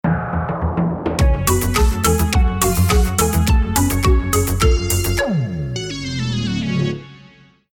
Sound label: lost round